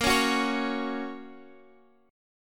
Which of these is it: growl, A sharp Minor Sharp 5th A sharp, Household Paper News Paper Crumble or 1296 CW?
A sharp Minor Sharp 5th A sharp